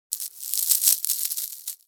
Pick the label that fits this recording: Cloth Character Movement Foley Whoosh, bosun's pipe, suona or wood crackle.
wood crackle